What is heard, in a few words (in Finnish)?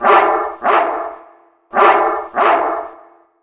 koira